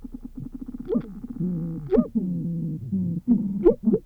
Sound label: Campylocentrus, treehoppers; Cocroft